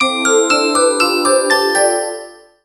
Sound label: classic alarm